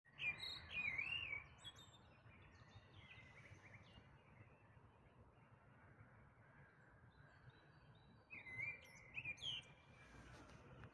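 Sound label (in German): Dawn Chorus, Vogelgesänge vor Sonnenaufgang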